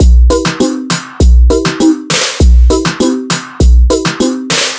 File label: Percussive Elements